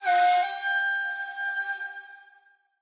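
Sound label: Skill background music; Naruto Ultimate Storm; android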